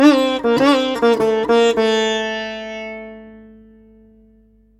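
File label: Sitar A minor 100, Indian Sitar; Indian Sitar Vol. 2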